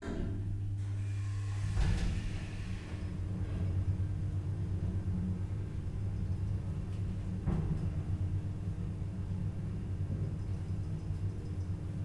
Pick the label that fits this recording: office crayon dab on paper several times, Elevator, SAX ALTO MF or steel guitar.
Elevator